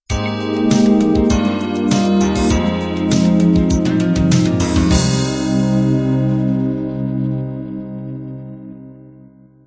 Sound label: pop ballad end